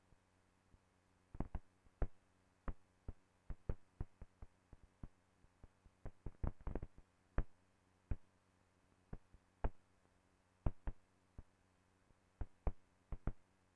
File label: crackle; HFP audio; bluetooth; pop noise